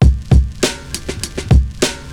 break, breakbeats

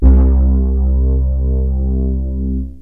syn, loops synth

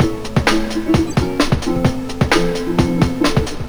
guitar, loops

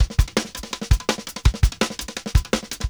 breakbeats

